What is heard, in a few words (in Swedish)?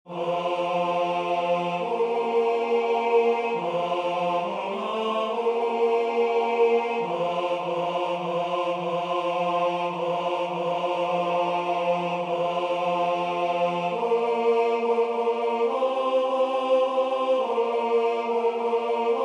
Jul jul stralande jul BAS